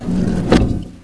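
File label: Elevator close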